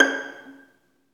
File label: STR Violin, Solo Violin FX; Roland L-CD702; Solo Vln FX